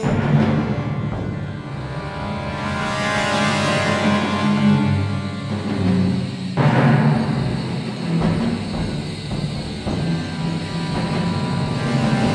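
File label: suspense loop; Star Wars Bounty Hunter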